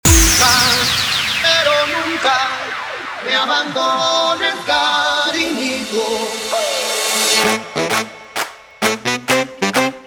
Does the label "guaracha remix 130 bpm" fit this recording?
Yes